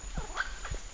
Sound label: diana, vocalisations